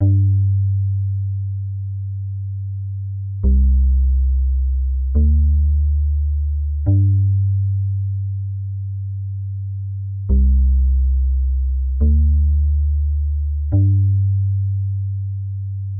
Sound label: BASSLINE, BPM 120; baseline junkyard